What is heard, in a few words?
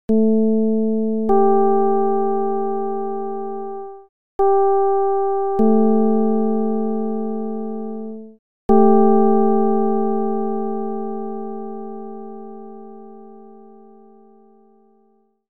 csound foscil 220hz